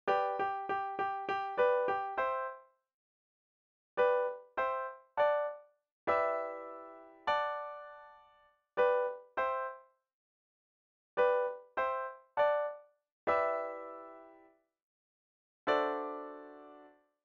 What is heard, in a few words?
prechorus rhythm